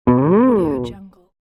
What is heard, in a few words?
cartoon electric bass guitar comical slide